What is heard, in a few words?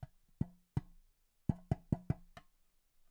LOOP Perc Mono